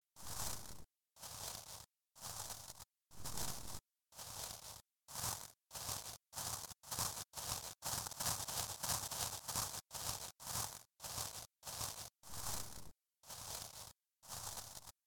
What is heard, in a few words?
dry leaves, Walking Sound; The NIW Project; NIW: Natural interactive walking